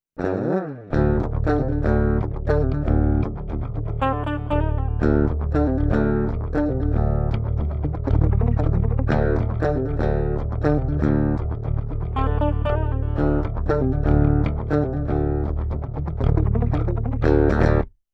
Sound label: DI; без реампа